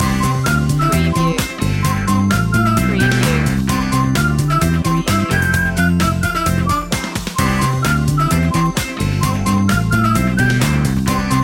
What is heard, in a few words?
happy shopping loop